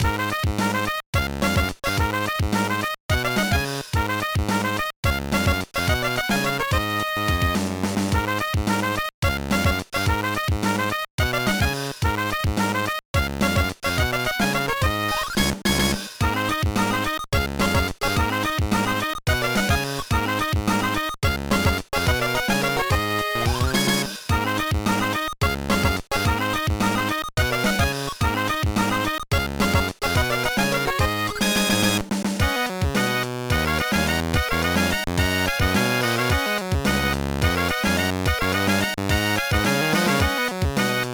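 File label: background music; No No No Puzzle Chailien; GBA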